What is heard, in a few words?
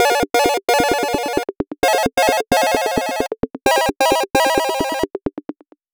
Neptunium, alarms; AOSP